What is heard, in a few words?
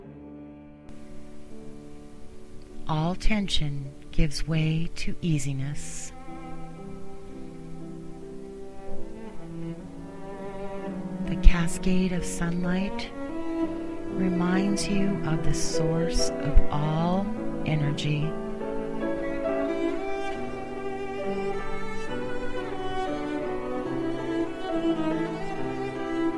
Healing Meditation, classical meditation; healing themed